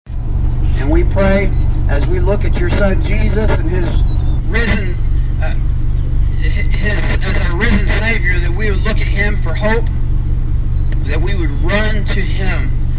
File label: cars honking amen